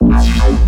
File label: Laser Wobble